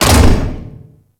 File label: Galleon Barrel Drop; Warframe; Ambience; Grineer Galleon; Galleon Props